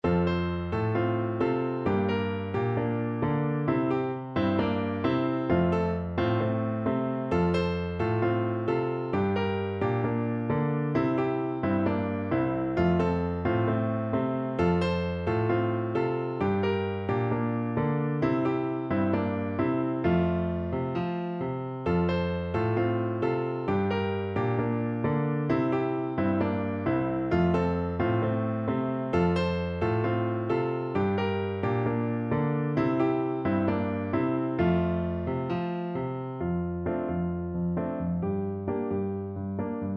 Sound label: shake the papaya down piano